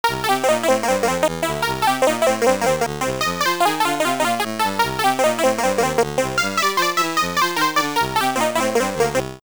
Bonus Round Clear Music; hopping mappy

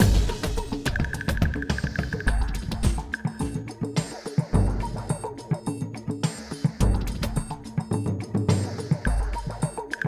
Stealth LOOP, soundtracks; bolt 2008 pc gamerip

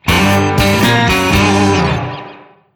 Sting Finish

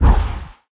Sound Appear; Peace, Death

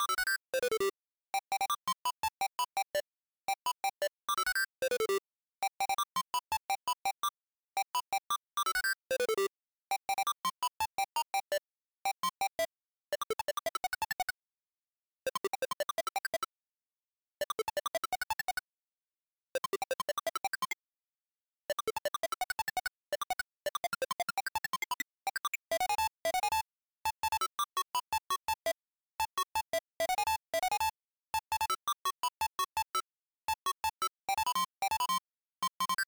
melody; game builder garage 2021 switch

